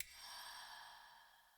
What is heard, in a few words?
UI Default Map Hover